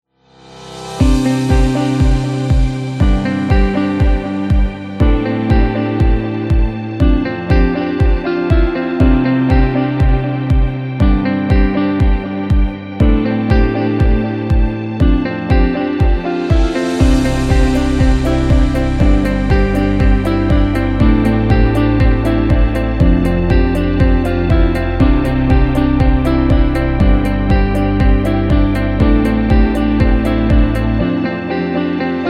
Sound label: Background Corporate